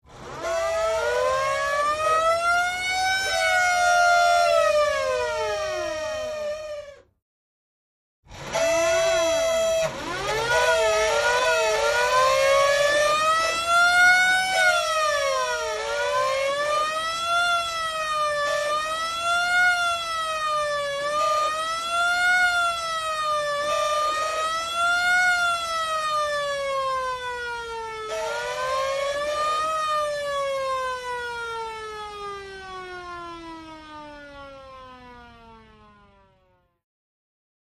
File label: Hand-turned Siren: Various Speeds. Malfunctions Occasionally. Dual; sirens